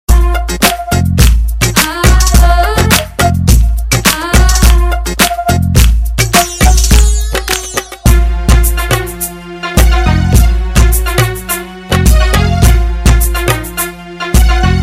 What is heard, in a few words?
arabic instrumental ringtone, islamic ringtones